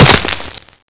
neck snap; Physics

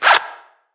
small medkit

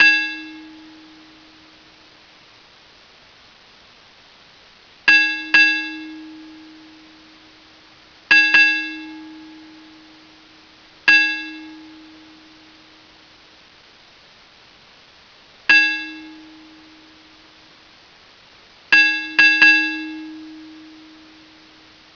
bells; barney

